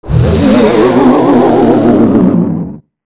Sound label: Goht Melt; Goht melting from ice